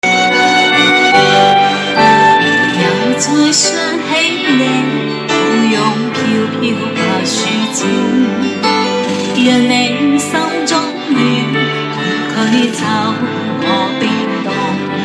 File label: Cantonese Song